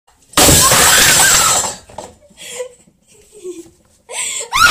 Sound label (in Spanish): taburete sound